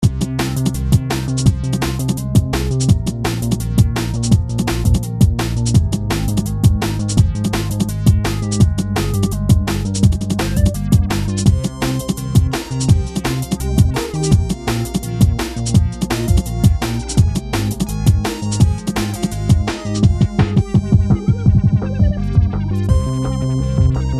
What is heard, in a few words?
music